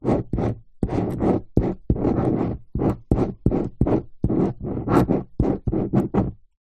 office pen ball point draw diagram paper on plastic table